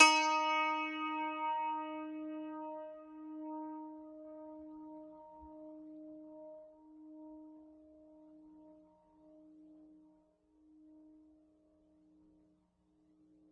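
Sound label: zither D4; multisampled; world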